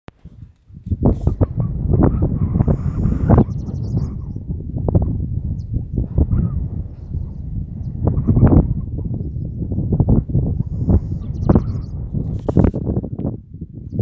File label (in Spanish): Cachirla